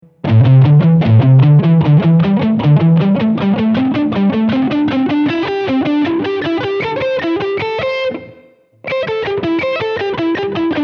Pentatonica Ribattuti

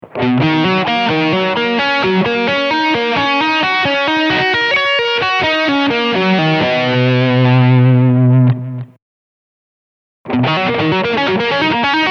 SImaj7 pos2; Guitar Club Settembre 2015

